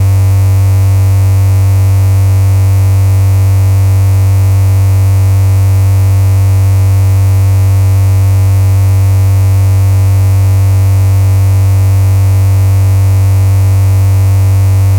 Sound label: Drone